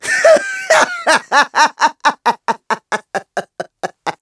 Maniacal Laugh; Ghirahim laughing maniacally as he enters the room; Ghirahim's encounter with Link, Zelda and Groose in the Sealed Temple; Skyward Sword

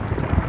Boulder Loop; Zelda; OOT

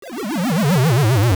Genie Disappear

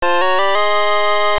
Fanfare Item; "Get Item" fanfare; Zelda Oracle

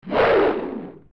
Swing Down; Big Moblin; zelda; OOT

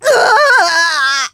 Defeated; The Lady defeated (heard in each phase)

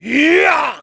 Final Dash; Ganondorf dashing toward Link (cutscene before the final battle); Zelda

